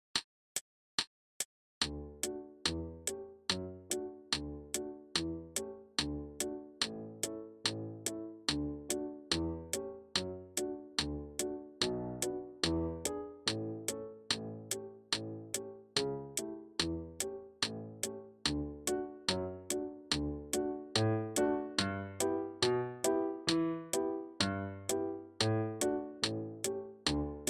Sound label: gavotte repeats full tempo no solo with clicks